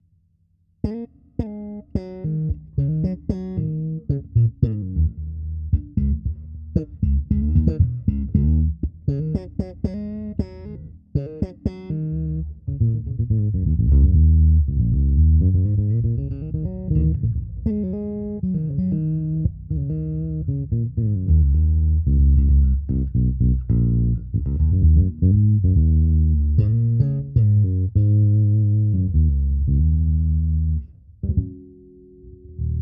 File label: electric bass, condenser; lewitt; dtp640 rex